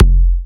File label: kick, drum hits; Anthemic